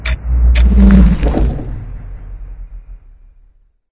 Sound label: powerup time